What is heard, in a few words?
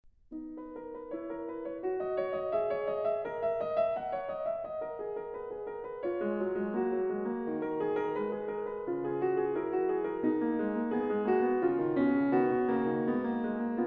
Prelude in B-flat minor, The Well-Tempered Clavier; BWV 867; The Well-Tempered Clavier, Book 1; Angela Hewitt